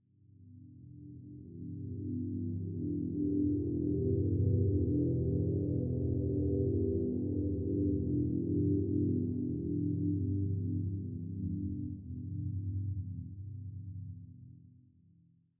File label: Wind Voc Blade; inside